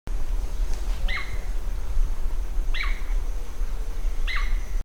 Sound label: Chiroxiphia caudata; Call; recorded Estancia Kanguery, Departamento Itapúa; Paul Smith March 2007